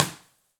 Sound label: Purgatory Chasm, Rock